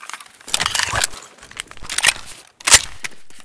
deagle reload empty, weapons

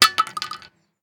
Shell 12ga metal; STALKER; IWP

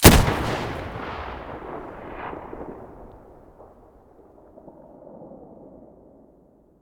muzzle M700 mono, weapons

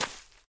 bolt grass, material; STALKER; IWP

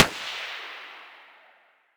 scar muzzle mono far

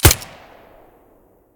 silencer fiveseven stereo, weapons

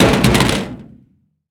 pistol drop metal thin; STALKER; IWP

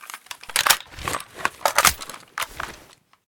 ak74 reload, weapons; STALKER; IWP

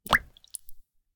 shell 39mm water; STALKER; IWP

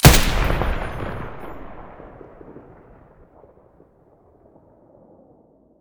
muzzle m249 stereo, weapons

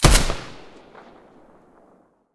ash12 silencer mono, weapons; STALKER; IWP

